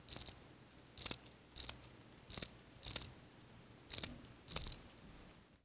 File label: bug cricket loop